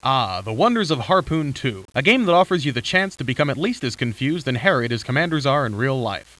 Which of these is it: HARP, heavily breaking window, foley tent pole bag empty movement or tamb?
HARP